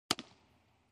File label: Baseball Mitt Impact